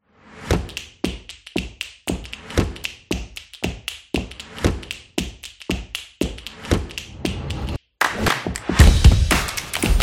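Street Dance, Street percussion background music built for sport, motion, and dance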